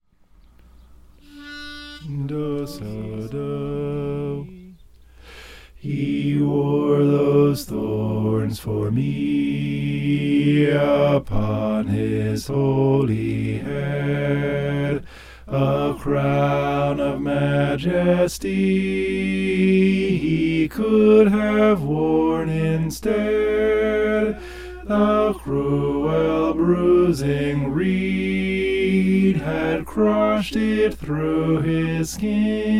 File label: bass